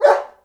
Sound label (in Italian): cani dog; animali